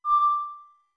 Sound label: Ocarina D2 short; OOT